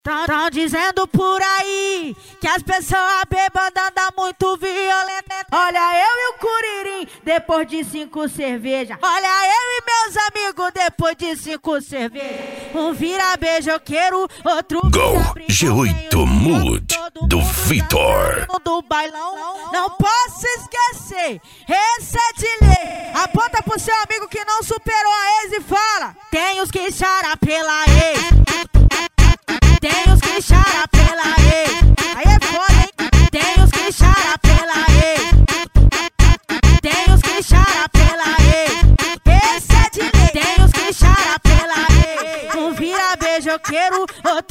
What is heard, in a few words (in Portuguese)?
funk ritmado